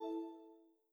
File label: Windows Error; GMM Windows 10